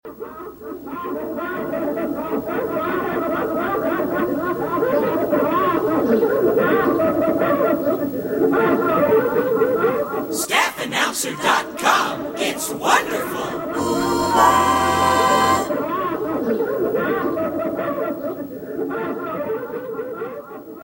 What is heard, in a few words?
laff dark laff